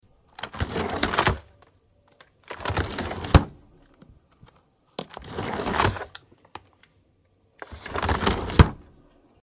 refrigerator

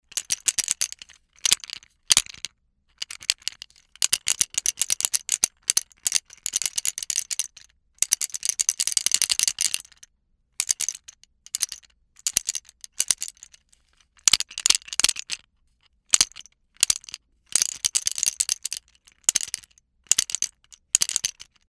pachipachi

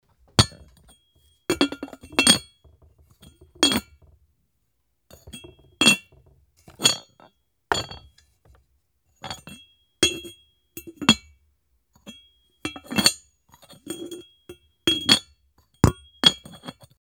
teapot

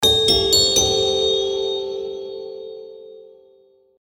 ethnic, jingle

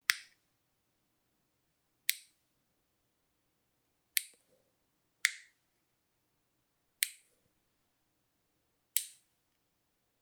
nail nippers

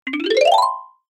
kirarin

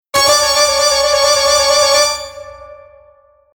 fuan

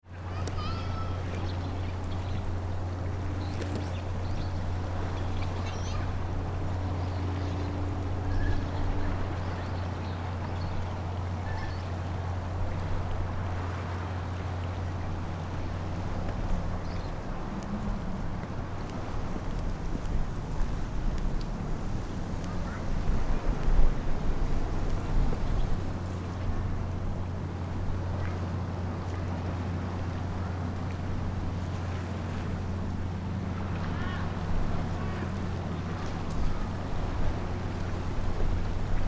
wave, child playing

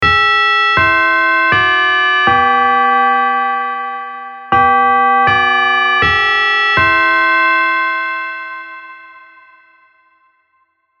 kinkonkankon

